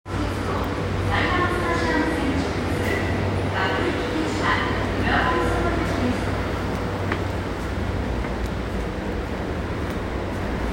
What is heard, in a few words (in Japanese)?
南北線赤羽岩淵南，そして北